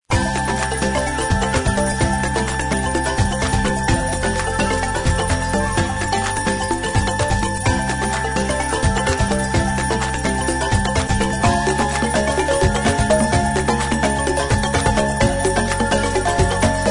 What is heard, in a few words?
big win